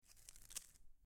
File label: footsteps twig; weather and nature